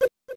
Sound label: Taking Damage; Metroid (USA)